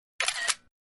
iphone screenshot

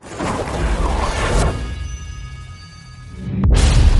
magic spells

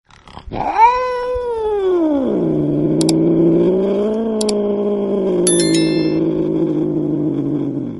cat rap

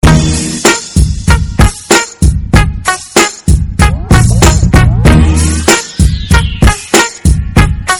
thug life instrumental

